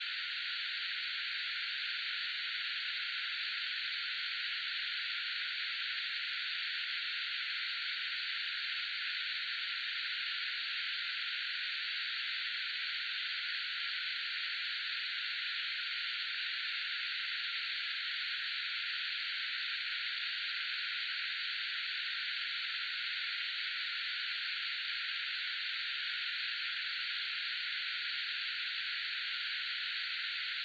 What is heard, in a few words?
radio static; sonniss gdc